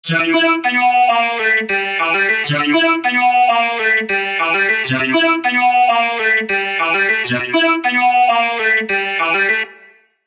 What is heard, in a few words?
Vocodex Test